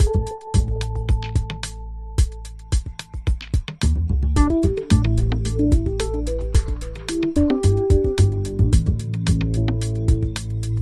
Drifting Hop Bass

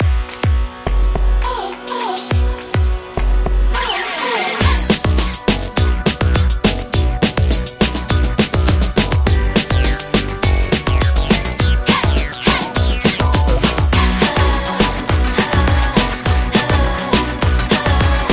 Poppin Rockin

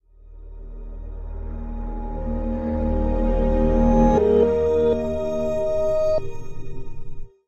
Sonic Abstract

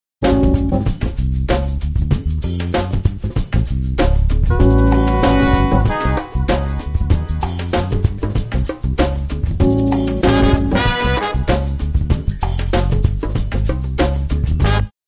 Funk

